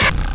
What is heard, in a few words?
bow hit; shot